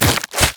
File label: item sight ward lantern; LoL